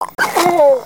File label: Pet Tortoise Moan; creatures pets